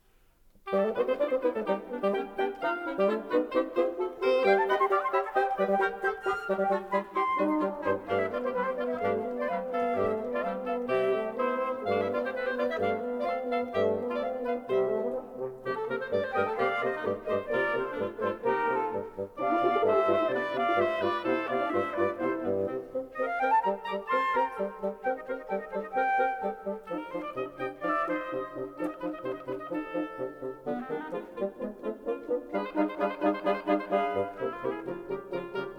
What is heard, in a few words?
quintet; Arrieu; chamber